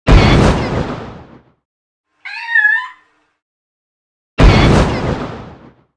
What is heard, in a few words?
emoticon